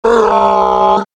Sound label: eyeball mad; vo; tf2; garrysmod; halloween eyeball